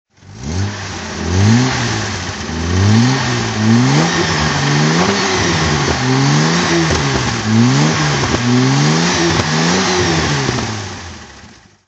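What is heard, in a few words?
E-type 42 s2 ots throttle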